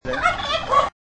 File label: turkey